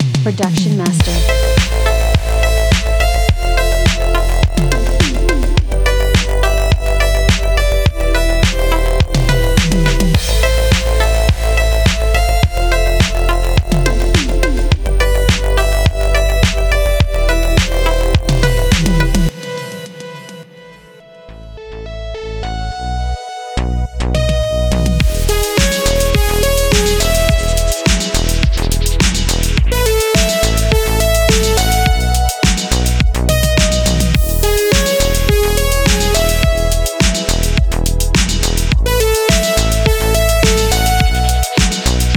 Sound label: Eighties Synthwave; Production Master; Electric Escape